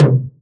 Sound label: Tom 80s Space Craft; Drums